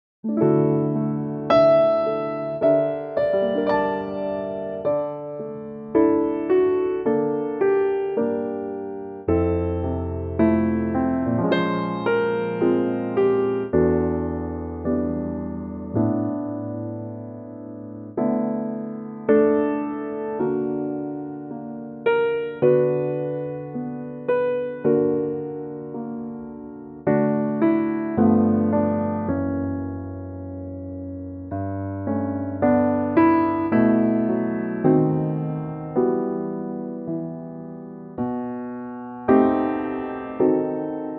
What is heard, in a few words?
D Piano; key - D - vocal range - F# to A; Disney; Cinderella